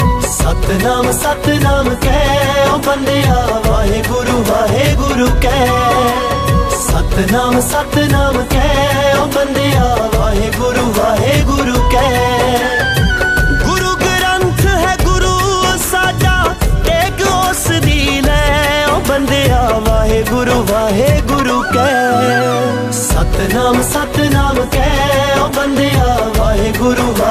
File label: Satnam Waheguru, Sikh Ringtone